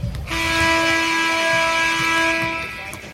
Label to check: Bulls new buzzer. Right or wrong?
Right